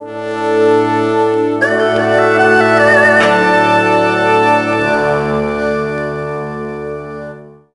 Sting Results Quest Complete

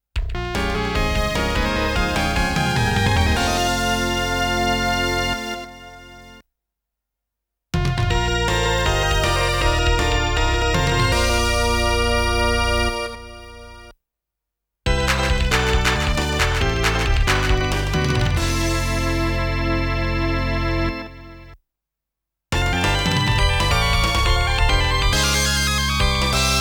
Electone Medley; Namco Game Sound Express Vol.21; Great Sluggers

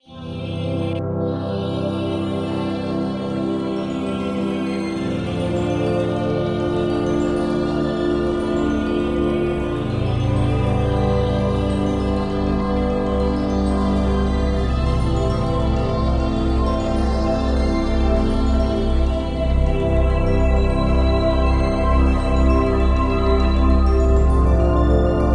Dungeoneering Floor Track

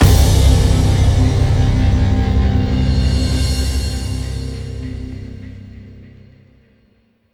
The Bass End; Scourge Outbreak

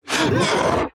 pain

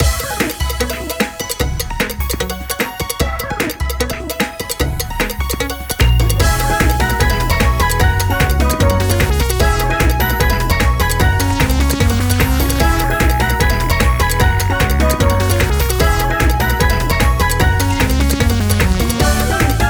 MUS cricket; EyeToy Play Sports; PlayStation 2

